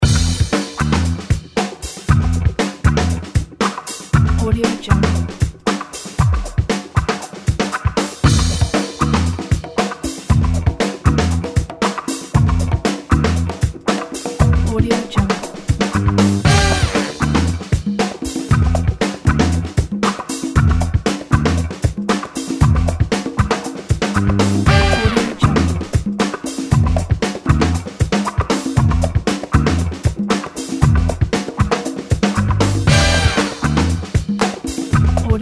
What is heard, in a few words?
retro action funk groove upbeat music